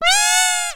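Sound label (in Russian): плач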